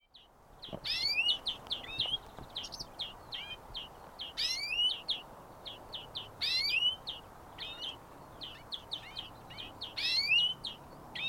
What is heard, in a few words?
European Greenfinch Chloris chloris